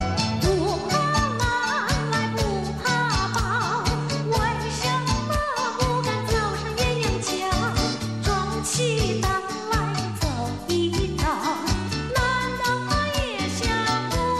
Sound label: taiwan ringtone